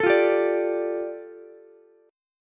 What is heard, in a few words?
A 7th Suspended 4th Sharp 5th, A, down, Ukulele; A7sus4#5 strummed